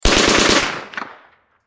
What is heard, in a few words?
Gun Submachine Gun, 9mm German Mp40 Short Burst Close; Sub Machine Gun Automatic; Close Perspective; Warfare